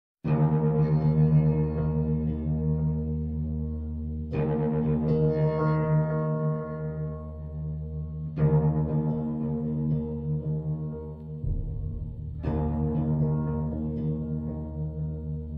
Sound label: combat music tension; the last of us; factions